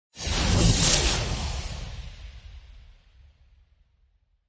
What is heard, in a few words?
Storms Meteor; Gothic Storm Music; Advanced Sound Design 2012